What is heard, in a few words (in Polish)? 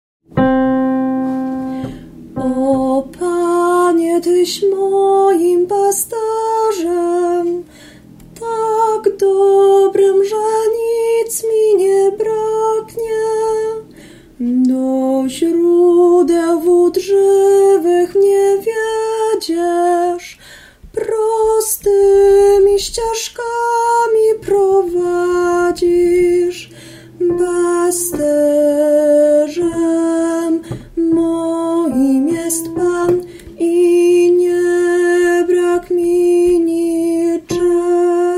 O Panie Tys moim Pasterzem ALT, chór